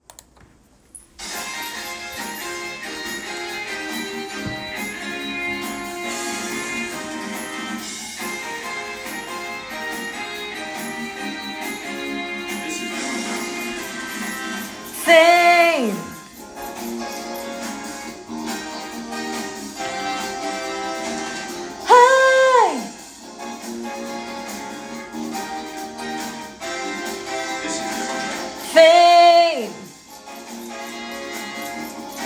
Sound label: Tenor; Fame The Musical - Holiday Pack